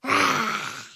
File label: Lion Roar